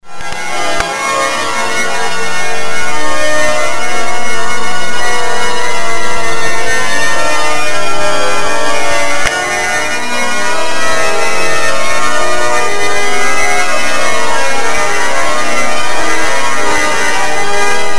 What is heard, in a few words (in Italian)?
organo, chiesa; chiesa vil minore